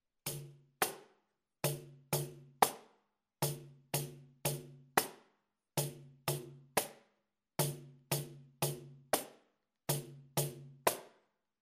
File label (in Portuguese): partido alto on slow, pandeiro